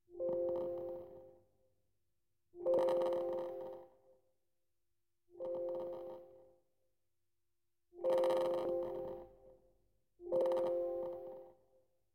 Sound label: Recording of crackling noises coming from the speakers; laptop